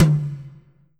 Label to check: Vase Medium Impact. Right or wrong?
Wrong